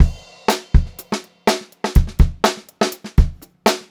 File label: Funk-Groove Drumloops, 122 FUNKY, R; USB Soundscan vol.38; AKAI; 90 s Sample CDs